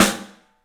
STEEL S, drums; 3D Sound

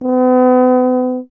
tuba; Miroslav Vitous - Solo Instruments VOL-2

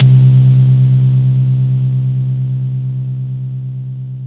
BASS, C2; Zero-G; Chemical Beats; BASSES

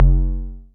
Multi-Low-Perc

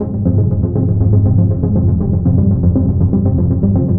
Trance Synth; Sonic Foundry (Sony Creative Software); Trance NRG VOL-2; Trance Synths

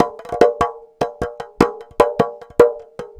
SMALL CLAY DRUM; Sonic Foundry (Sony Creative Software); Vir Unis Infusion

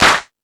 Crash, Drum Machines E-MU Pro-Cussion

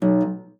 STOP A#1, harp; Xsample VOL-8; HARP+FX

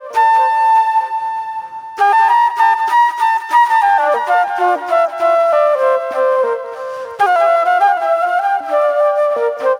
Acid Trip Flute; Sonic Foundry (Sony Creative Software); Manhattan Flutes; Smooth Groove

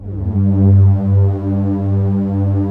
PADS; Pro-Rec - Synthsonic; Z1 PADS

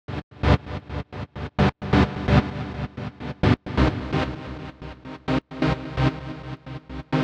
Gated Synthline (130BPM); creative gate; Loops; musicradar

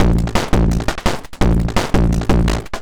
Beat Octofuzz 170, distorted drum loops 170bpm; musicradar

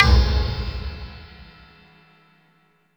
HAJI, PERCUSSION

